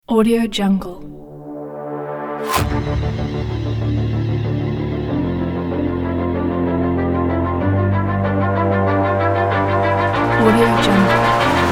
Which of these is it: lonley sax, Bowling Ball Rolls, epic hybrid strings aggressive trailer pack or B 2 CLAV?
epic hybrid strings aggressive trailer pack